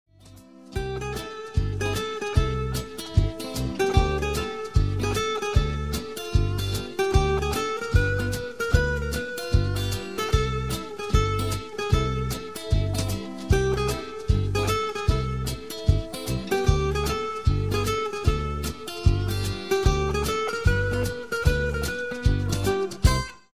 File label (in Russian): Небольшой проигрыш